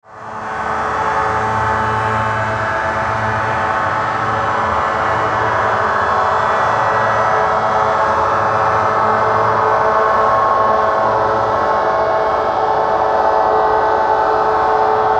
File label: DRONE DARK SWE, Drone Dark Swell Phase Long Reverb; Blastwave